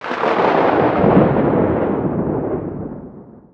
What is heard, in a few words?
Thunder Strike; 1944 Battle Of The Bulge